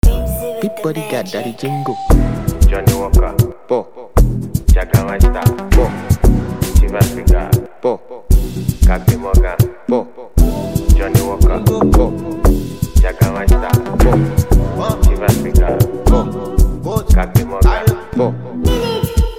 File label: Instrumental Hook